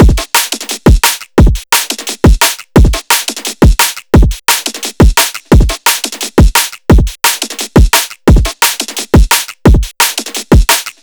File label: DNB FULL DRUM LOOP 174